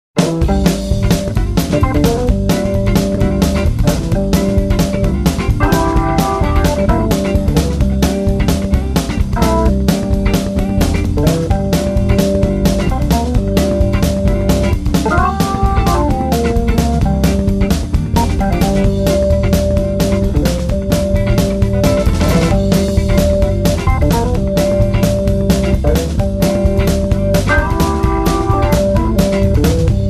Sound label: groove